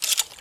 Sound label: shotgun reload; Ace of Spades